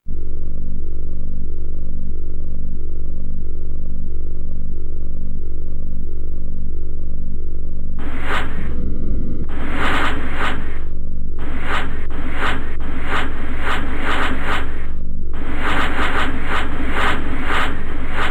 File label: Startup; 3DO Interactive Multiplayer